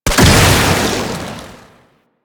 Arcane Melee Shockwave Radial Blast; Mods; Proc

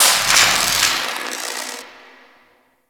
Dist Electricity, Ambience; Warframe; Corpus Gas City

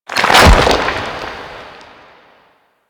Rock Stairs Start; Ambience; Orokin; Warframe; Orokin Moon

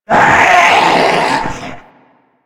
Zombie Attack, Vocals; Mariner Zombie

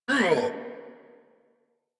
Pain; New War; Kahl

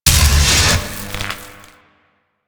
Alt Orb Zap; Weapons; Warframe; Tenno; Grimoire

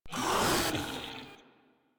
Vocal Pain; Warframe; Proto Infested Ancient

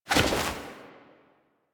air roll whoosh; characters; generic foley; Warframe

